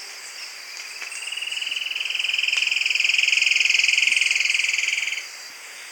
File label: Philydor atricapillus song; Andrew Whittaker; 40 m Ubatuba-SP